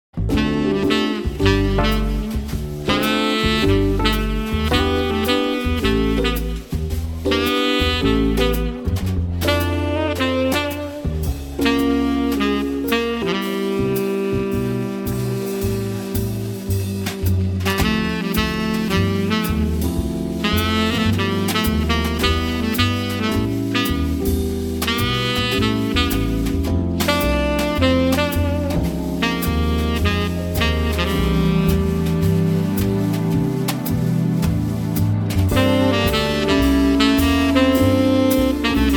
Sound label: Jazz Trio with Sax And Drums Live